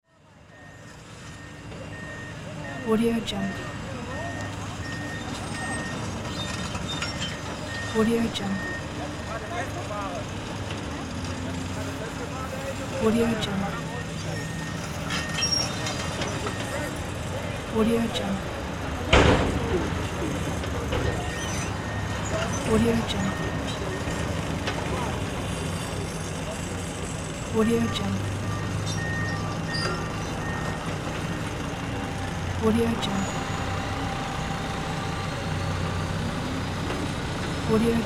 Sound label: urban construction site